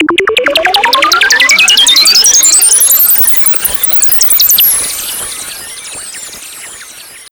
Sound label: FX SWEEP